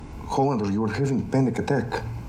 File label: panic attack; Hollander, you are having panic attack.